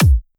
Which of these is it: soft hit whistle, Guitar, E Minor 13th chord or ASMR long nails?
soft hit whistle